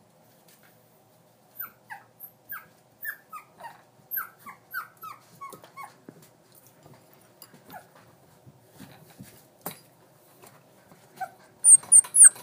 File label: baby coyote